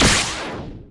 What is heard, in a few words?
Enemy Delete